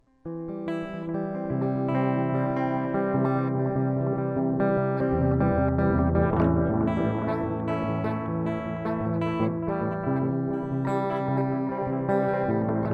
electric; MegaEpix

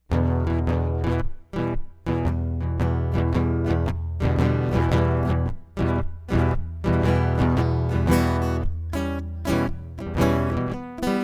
acoustic; MegaEpix

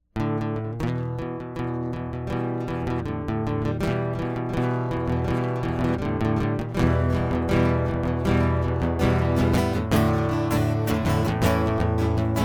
acoustic

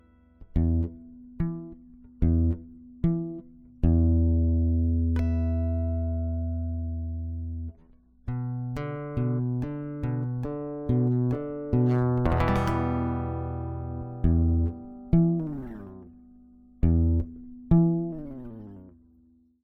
acoustic

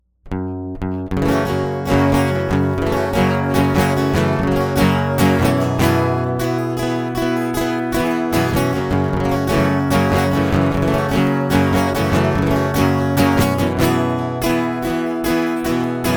guitar prelude

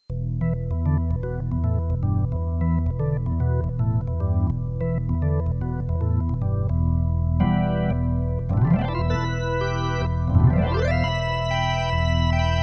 organ

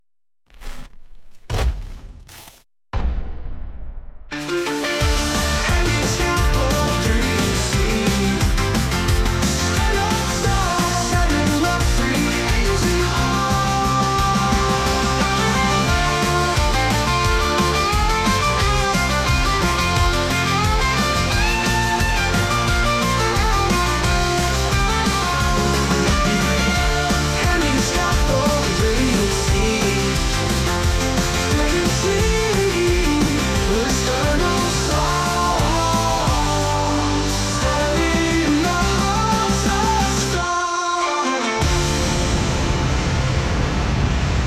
Interlude

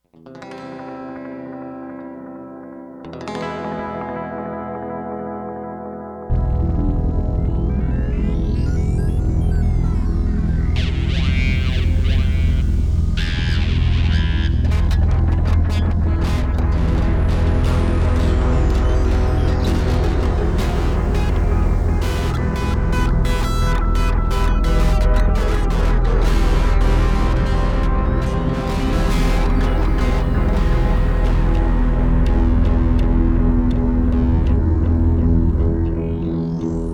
hard space intro

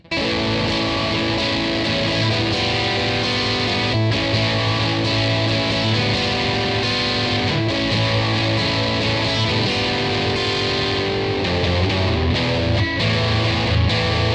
electric

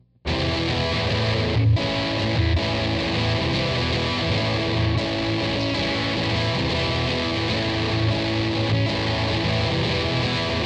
electric; MegaEpix

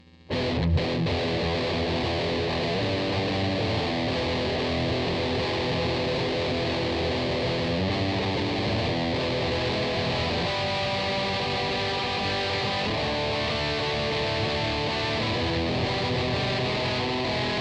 electric guitar; MegaEpix Enormous

